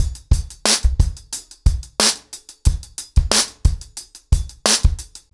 ACOUSTIC DRUMS, 90 BPM; The Roots Drumloops; Locked Out

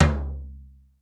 DAZZ TOM L; East West; Global Sounds

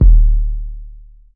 BD power, Drum Machines; 300 Drum Machines

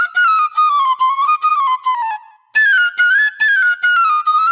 Eastern Flute; Sonic Foundry (Sony Creative Software); Manhattan Flutes; Garage Funk

